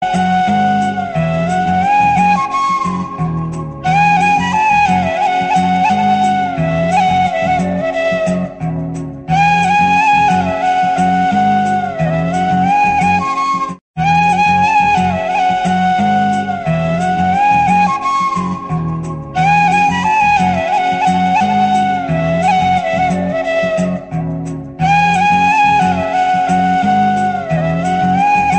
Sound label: Romantic Flute Bgm Tamil